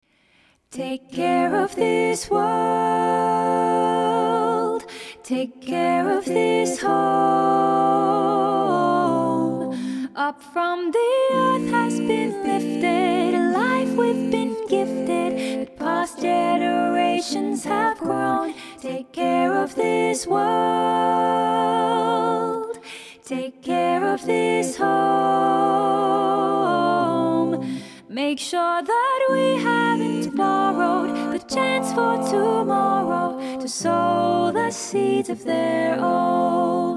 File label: choir a cappella